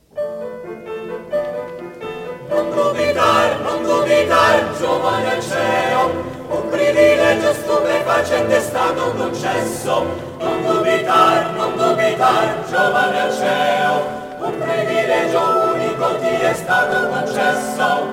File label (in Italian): coro